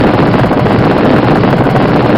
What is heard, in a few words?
EX PIT LIMITER, F1; AOS F1 2022; FSR2018